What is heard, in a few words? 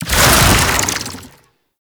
Infested Melee End; Oni